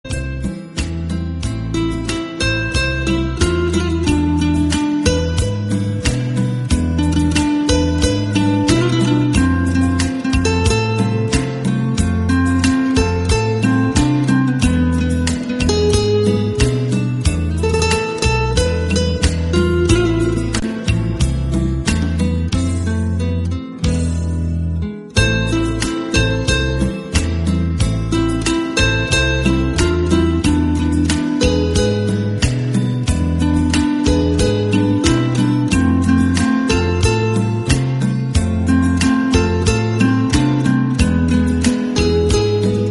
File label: best of romantic classical guitar